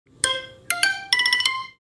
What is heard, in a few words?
lg woodpecker ringtone